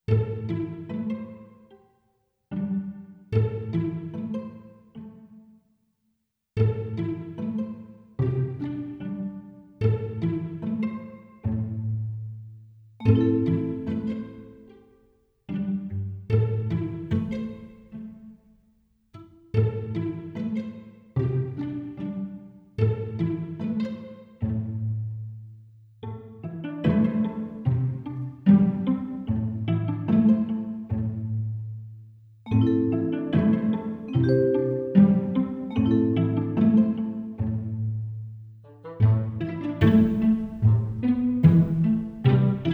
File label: Awkward LOOP; LEVEL JUNGLE